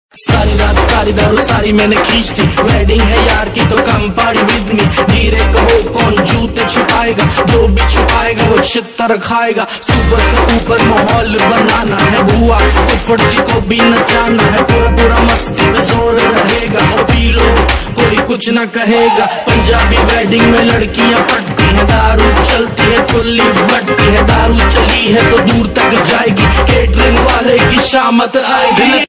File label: rap ringtone; Bollywood